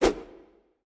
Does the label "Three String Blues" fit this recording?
No